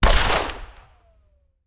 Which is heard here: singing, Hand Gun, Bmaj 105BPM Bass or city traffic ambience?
Hand Gun